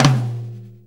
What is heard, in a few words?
4-4 Tom Flam, Drum Loops